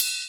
Ride; Equipped Music; Loungin House

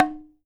OKAWA, JAPAN PERC; AKAI S6000 CD-ROM; WorldSounds

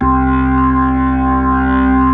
C2 SLO POWER, POWER B3; Northstar - New Gold VOL-1

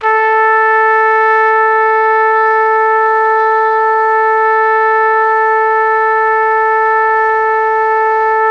A3, Pop Brass; Best Service ProSamples vol.19; AKAI

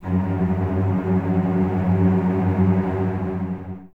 cello F#2 tremolo, String Ensembles, Cellos; Miroslav Vitous

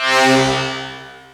PPG WAVE, OLD SYNTHS; Rubber Chicken Software Sample Bank

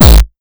909 dist, Drums; Deepsky

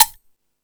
Percussion FX, E-MU Pro-Cussion

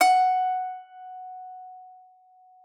PSAL F#5, PSALTER; Xsample VOL-8

